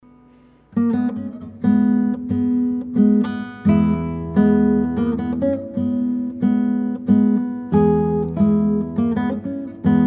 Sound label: Try Waltz